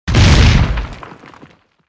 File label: bomb